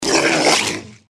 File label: gecko snarl; npc